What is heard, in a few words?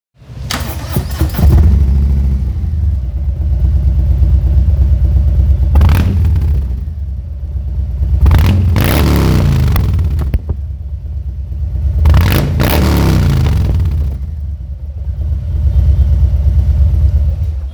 Fatboy 107 vance hines